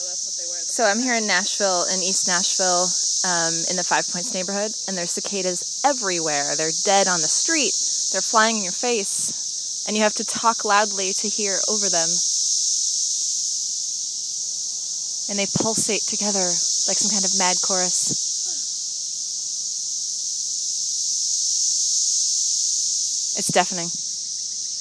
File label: cicada chorus in Nashville